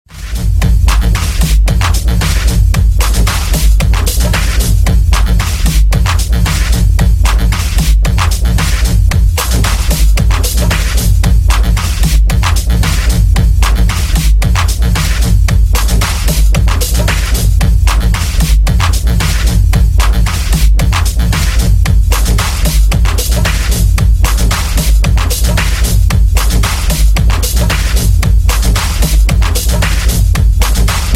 instrumental

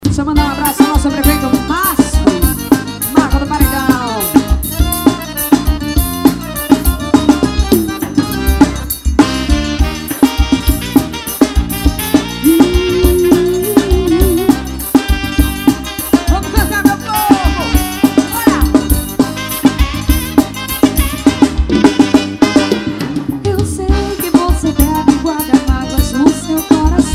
xote forro das antigas

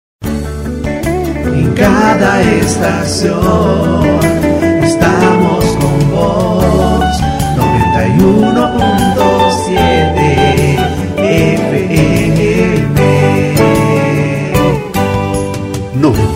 vinheta cantada fm

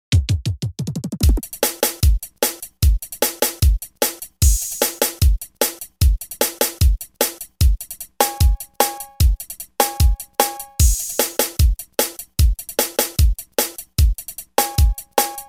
brega remix

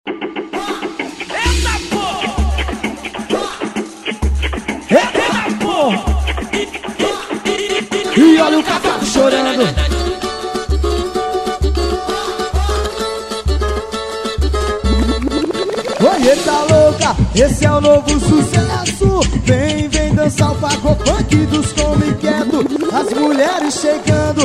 pagofunk

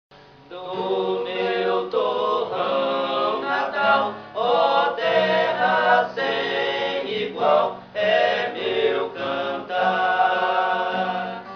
coral a quatro vozes

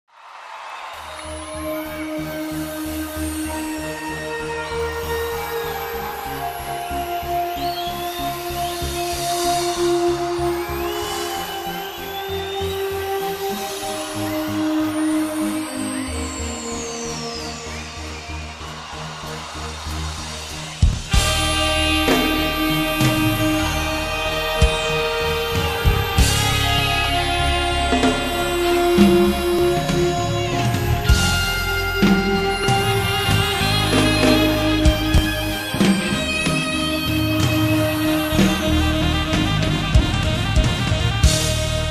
abertura teclados

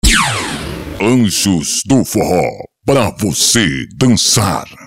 vinheta forró

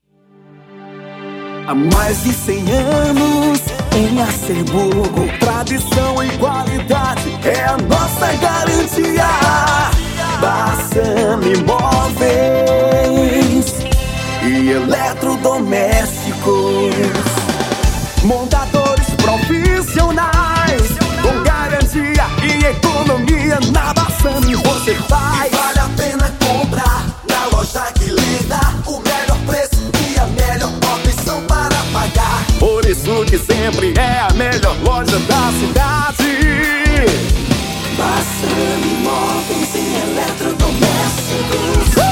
jingle

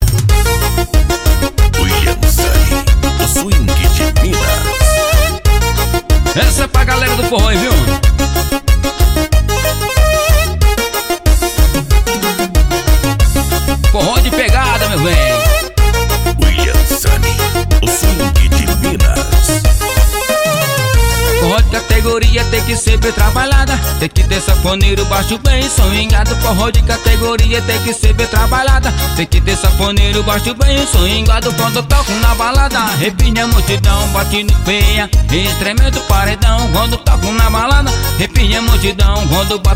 forro de categoria

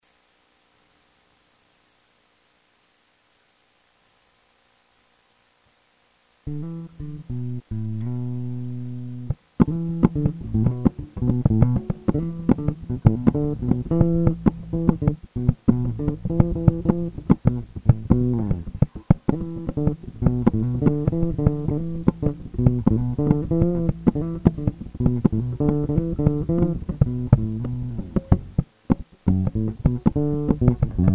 blues band o tantan o baixo

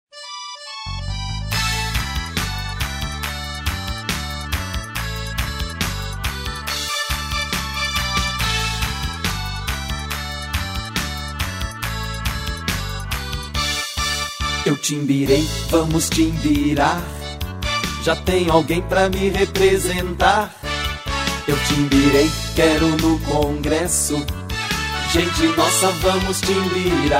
jingle forró; timbira deputado

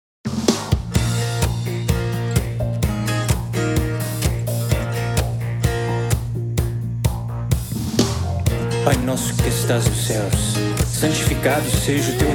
abertura

